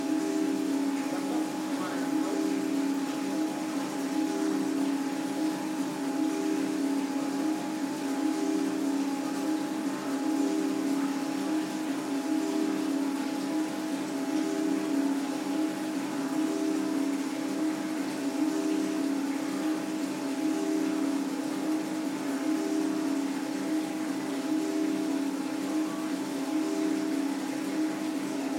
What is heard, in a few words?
Warehouse Kawasaki